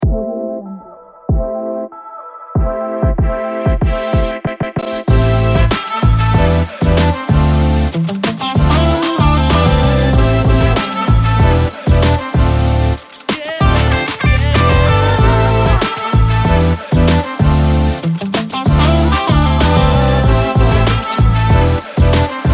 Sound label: the hip hop; acousticfolk